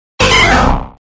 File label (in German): Ruf